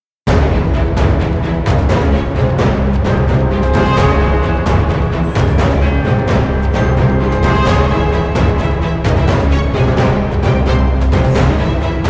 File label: Battle Music; The Dawn First War VR; VR, Windows